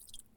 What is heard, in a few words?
splat